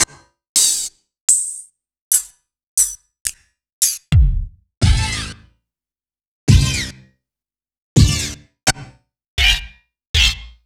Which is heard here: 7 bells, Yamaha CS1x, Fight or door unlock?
Yamaha CS1x